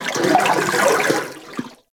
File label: Coolant Tank Step; Warframe; Corpus; Arachnoid Coolant; Steps